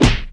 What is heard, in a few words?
PUNCH HIT